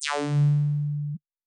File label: bomb